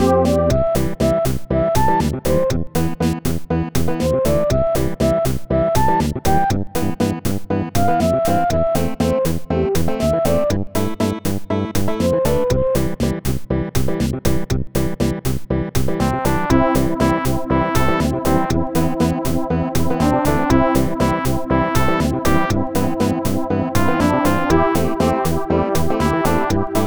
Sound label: Chill